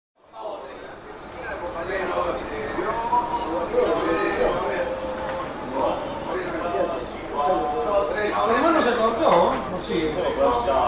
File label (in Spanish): bares mini terminal; barrio rucci